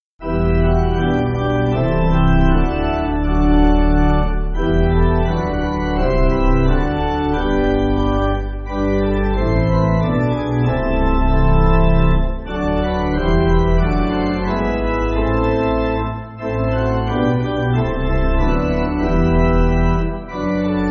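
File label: Pipe Organ, Music Backing Files for Churches; Jesus Redeemer Of The World; Szegedi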